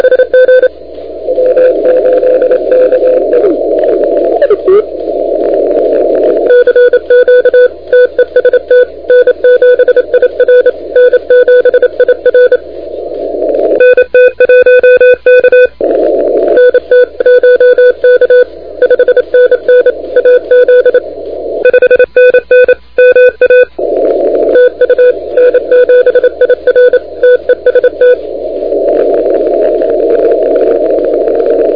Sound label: CW 28MHz; ARRL10M